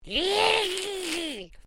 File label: Created creature small yech; Created creatures, vocalisations and pretend speech; Aliens